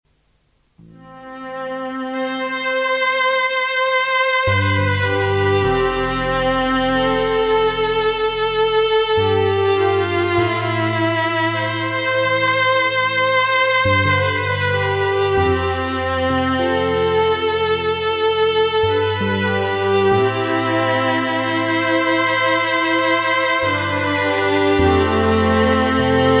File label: NORD VIOLIN